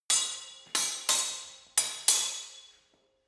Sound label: sword hit fast sword sequence